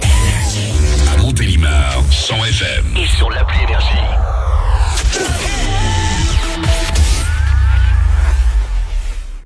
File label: JINGLE; MONTELIMAR, 100 FM; NRJ